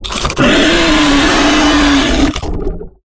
Acid Spit; Lurker; Evolve; Gorgon

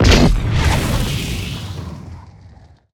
Leap Impact Launch FLAME; Evolve; Meteor Goliath

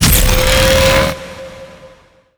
charging, Strider; Strider Remake

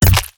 Teleporter Melee Impact Base; Evolve; Wraith

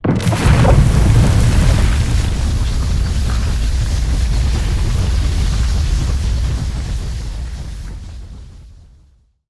Mortar Cannon Flame Puddle; Evolve; Behemoth

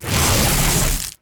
Melee Swipe; Grounder; Evolve; Kraken

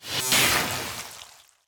Melee Impact Electric; Grounder; Evolve; Kraken

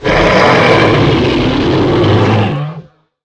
die; Necromancy

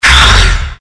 shadow hit

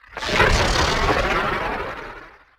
Nebula Cloud Prepare Start; Evolve; Wraith

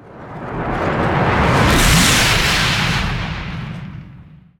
Lightning Strike Activate Start; Evolve; Kraken

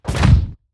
Climb; Grounder; Evolve; Kraken

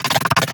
Lurker Emote; Evolve; Gorgon

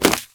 Climb Claw; Evolve; Goliath

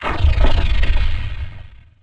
Teleporter Abduction Teleport Back; Evolve; Wraith

